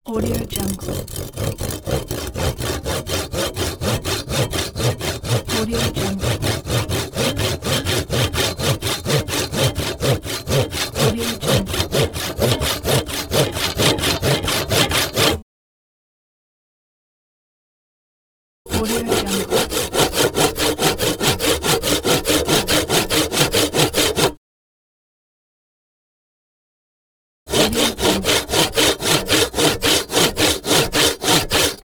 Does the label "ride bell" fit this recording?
No